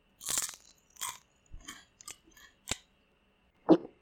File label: Item Eat Crispy